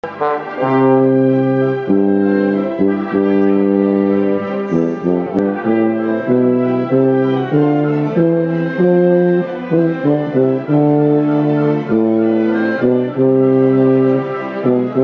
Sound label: Wagner tuba